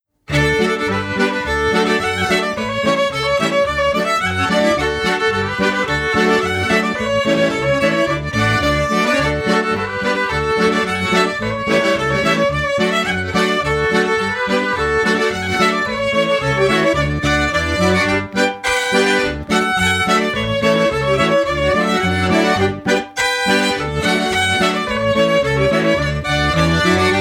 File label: Polka